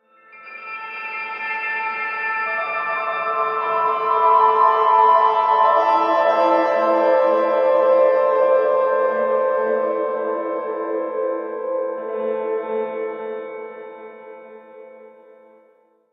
Map Piano; No Man's Sky

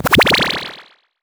Common Bom Chage; Pac-Man Championship Edition 2 Plus

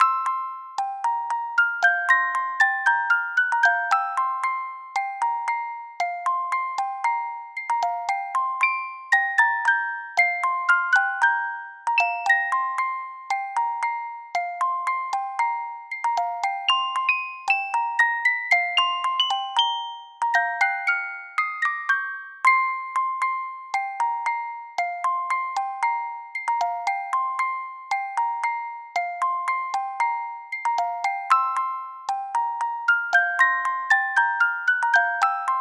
Ska (Music Box); animal crossing new leaf welcome aamiibo soundtrack

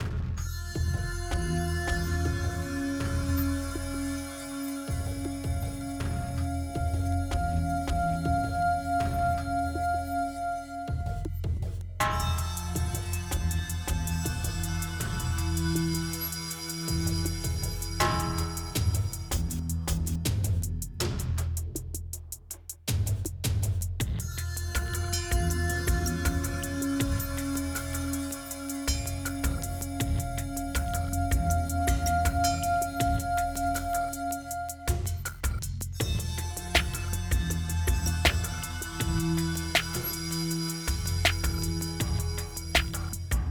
Music; Sifu

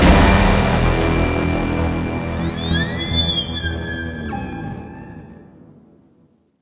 Sting; Stronghold5

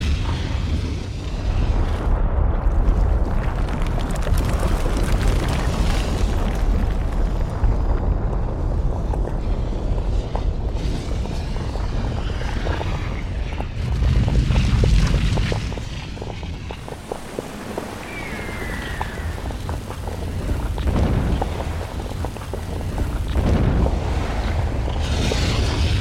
Region Background; Infernites; Calling All Mixels; 2014 mobile gamerip

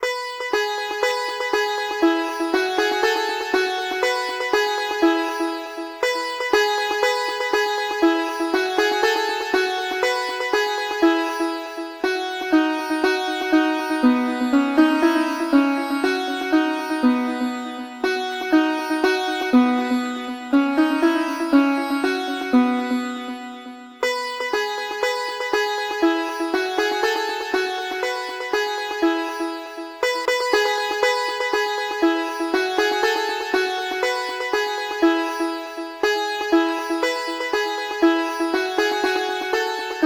oranges and lemons sitar; horace ps4 switch windows xbox one gamerip 2019; clockwork

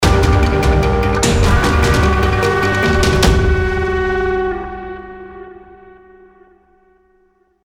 Jingle Boss Fight Jingle Outro

